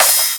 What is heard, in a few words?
Crash; Electro Flux Sound Kit; ED Crash